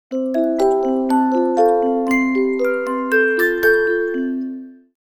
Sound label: music Box